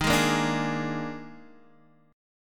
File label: Eb Major 7th Suspended 4th, Guitar, Half Step Down